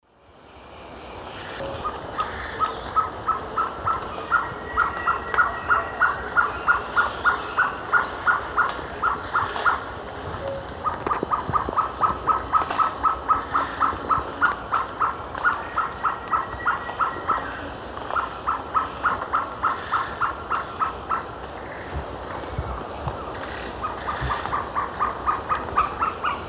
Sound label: Trogon violaceus, song male; S. America; Eastern Lowlands